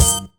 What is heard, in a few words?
sputter